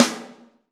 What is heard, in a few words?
LUDWIG SN MF, Ensoniq ZR-76